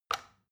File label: plastic button tiny release heavy